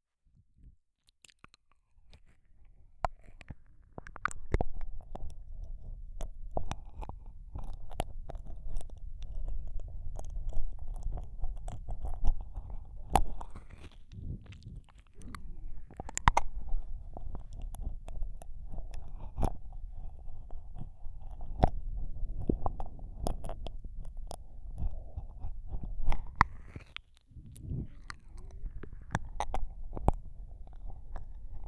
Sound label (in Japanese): 静かめの耳舐め, ASMR